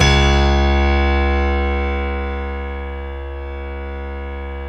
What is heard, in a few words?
StereoGrand, Keyboards